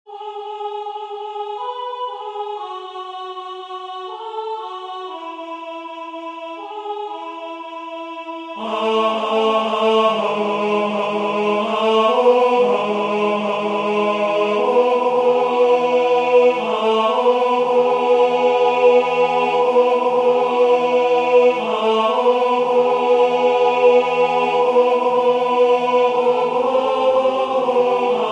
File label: tenor